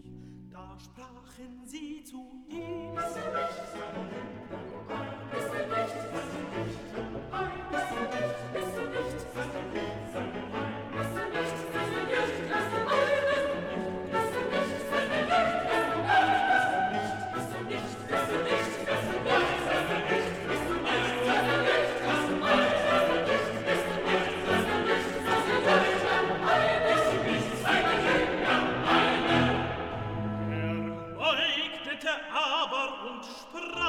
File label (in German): BASS, Stimmen mit Klavier; Johannes Passion